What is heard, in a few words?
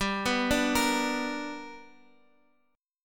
B Minor Major 7th Sharp 5th